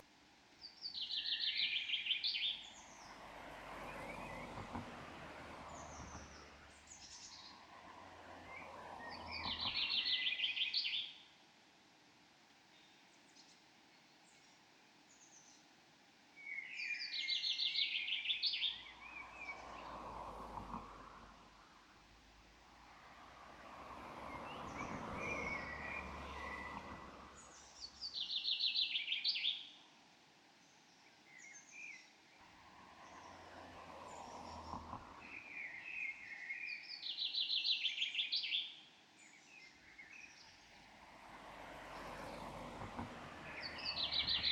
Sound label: park ambience